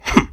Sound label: stun nail grab